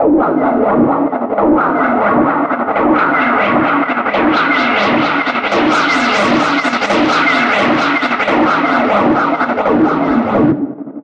174 G- Synth

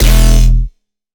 Dubstep Bass One Shot E; Vital

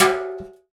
watering can

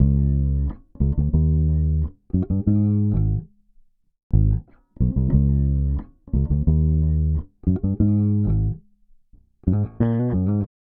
gtr bass

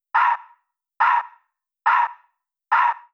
140 CHANT LOOP

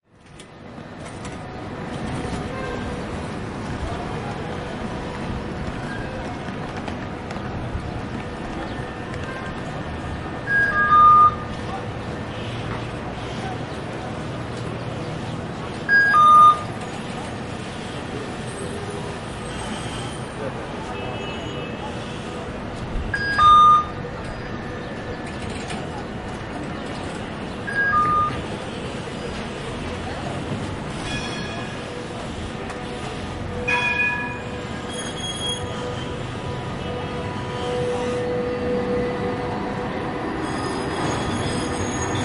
Tramway Station; Emniyet Fatih

